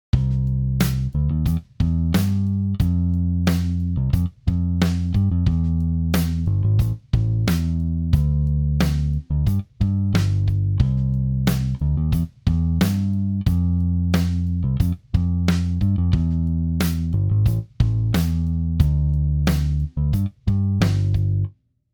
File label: bass pops; MODO BASS